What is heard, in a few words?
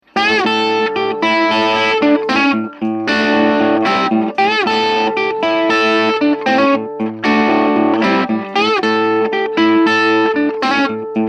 Normal Channel Ton Cut